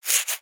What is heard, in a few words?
Owl Scratching; creatures organic; Owl Backpack; aronwk collection; ndaudio